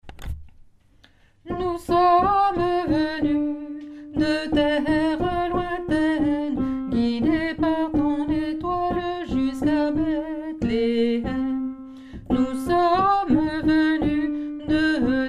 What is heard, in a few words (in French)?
epiphanie alto; nouveaux noels; chants noel